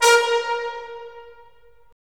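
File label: brass; Roland - LA Composer vol. 3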